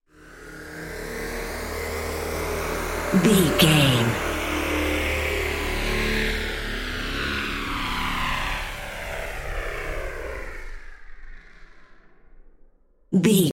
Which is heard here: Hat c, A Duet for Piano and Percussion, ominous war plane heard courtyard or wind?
ominous war plane heard courtyard